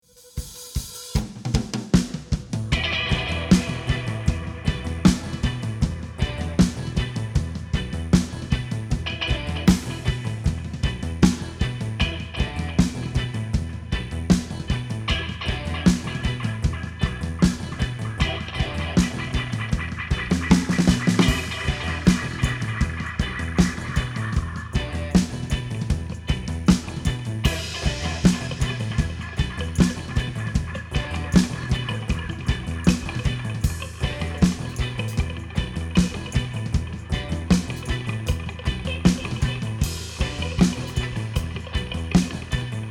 Psych Rock, 100Hz BOOST 30Hz ATTEN 10kHz BOOST 20kHz ATTEN